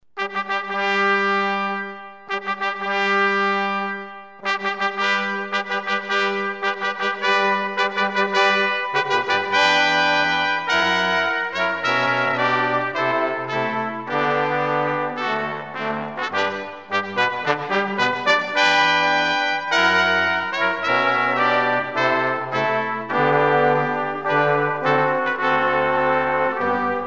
Mendelssohn Wedding March brass quartet; Classical Quartet

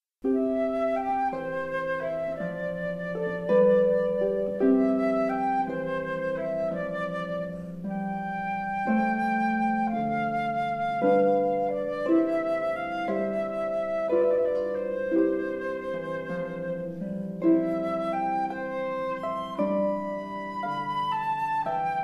Air with harp, Flute

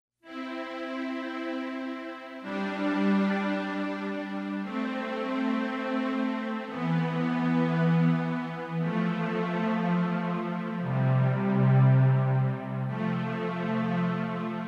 Strings

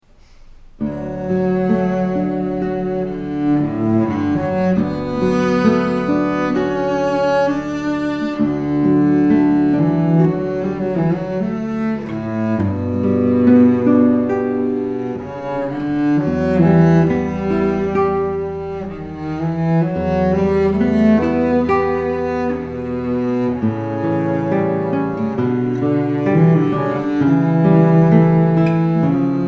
cello and guitar; Classical Guitar; Meditation

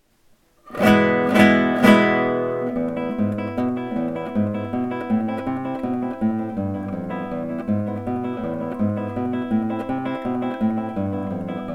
Guitar

